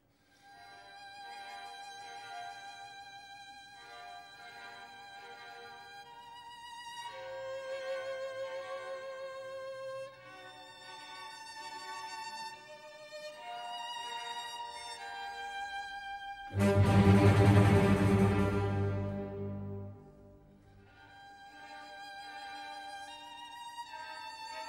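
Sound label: Vivaldi Violin Concerto In G Minor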